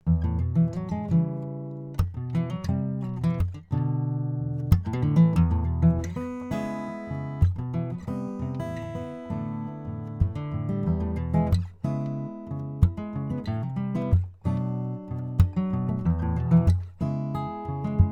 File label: ac gtr fingers before after